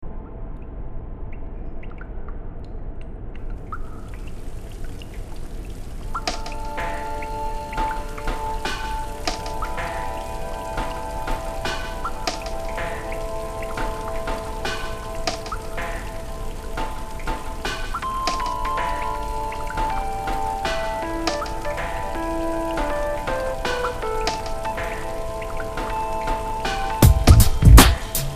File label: intro instrumental